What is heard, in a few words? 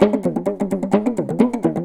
PAPAN, Indian Traditions